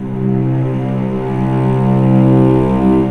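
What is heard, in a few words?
cellos C2, String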